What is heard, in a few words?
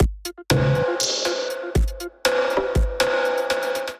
Pho Soup Kit, Drums; Ableton; Factory Packs; Skitter and Step